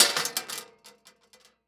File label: Weapon Knife Fall On Metal Ground; AdobeCC